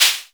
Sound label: Maracas